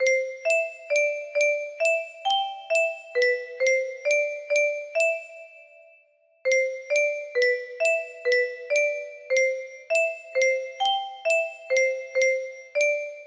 lullaby music box

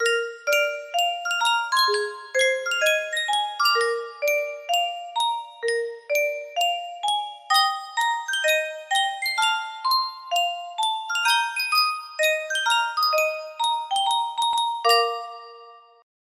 music box; yunsheng custom tune

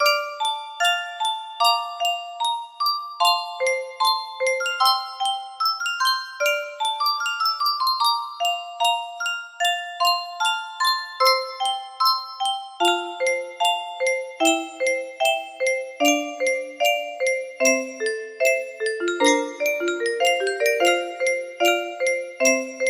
piano part

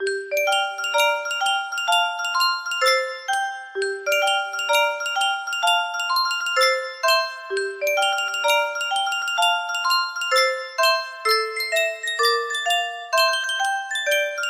Sankyo music box

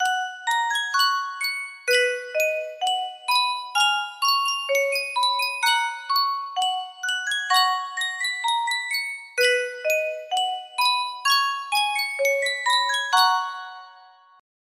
Yunsheng music box

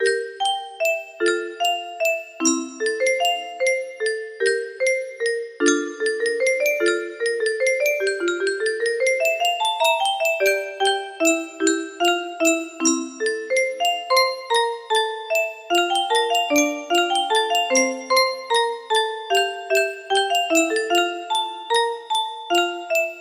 music box melody

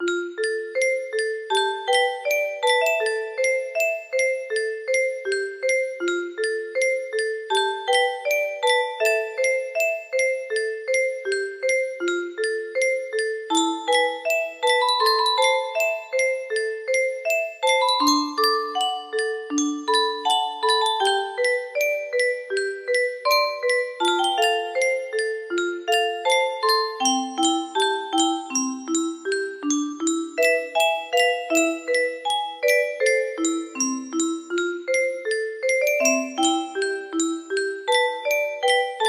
melody

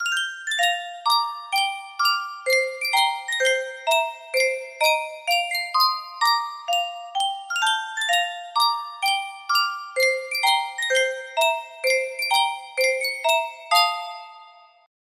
Yunsheng music box

